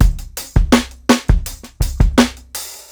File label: 82 16TH BEAT, DRUMS LOOPS; BASEMENT DRUMS VOL 2